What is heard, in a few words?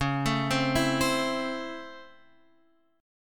Db Minor Major 7th Sharp 5th Db Guitar Open F; DbmM7#5 arpeggiated; JGuitar